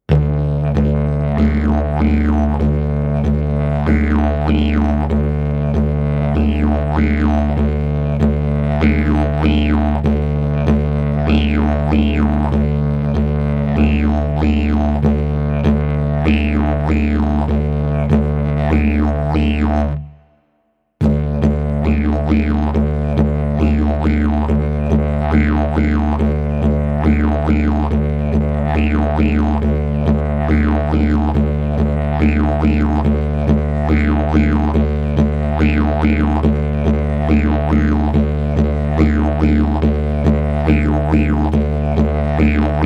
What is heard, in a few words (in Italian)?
ritmo livello principiante